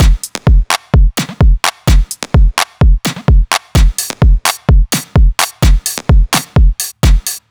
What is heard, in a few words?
Gqom Drum Loop